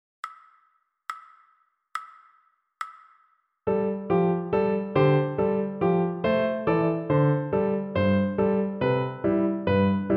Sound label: Poco Animato piano